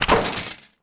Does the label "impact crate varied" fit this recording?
Yes